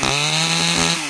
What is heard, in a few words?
engine, manhack; hl2